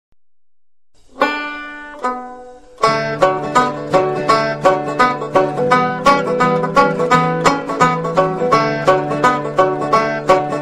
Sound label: concertina reel